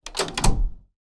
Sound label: Ambience Scatter Machines; Josh Journey Darkness Totems; PS4, Switch, Windows, Xbox One gamerip; Santuario